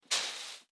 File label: Sizzle; SOUNDSCRATE